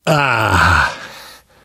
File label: pain relief sigh